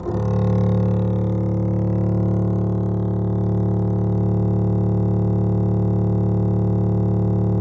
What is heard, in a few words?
DBL BASS, Synclavier Strings; ILIO